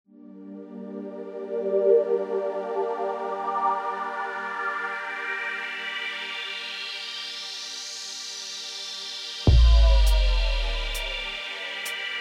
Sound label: ambient; Predator1.6.4